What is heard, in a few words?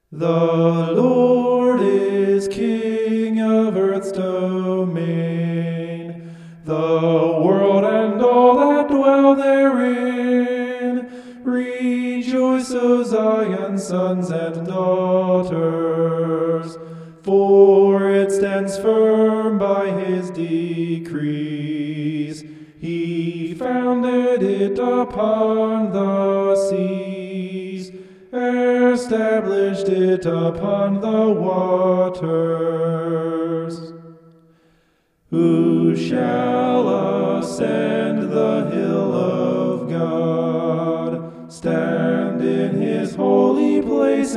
vocal homoph; psalm 24